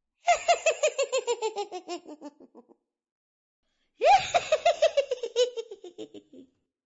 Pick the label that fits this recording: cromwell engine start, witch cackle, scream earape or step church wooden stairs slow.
witch cackle